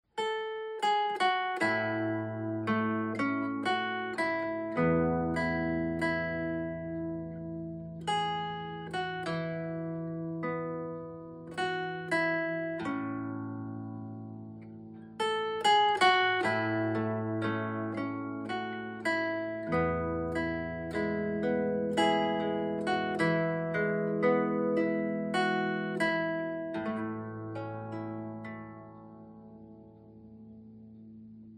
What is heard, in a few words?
Zither